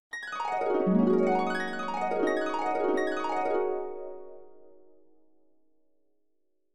dream harp

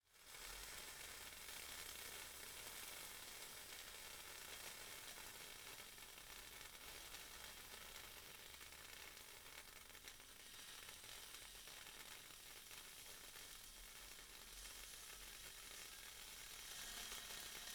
pot heating up, kitchen

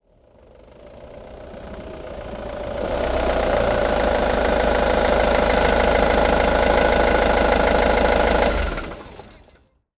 van arrive and shut off; transportation

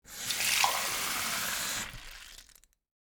water glass fill

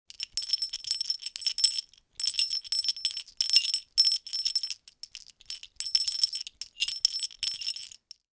jingle bell

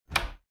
closet door open

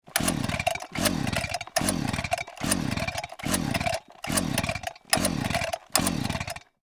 chainsaw pull cord; mechanical

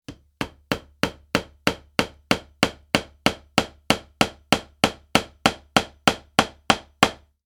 hammering nail; mechanical

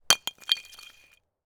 ice block drop; nature

